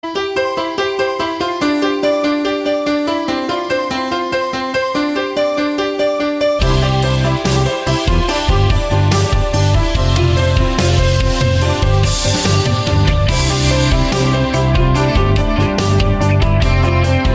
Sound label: xiaomi poco m4 5g ringtone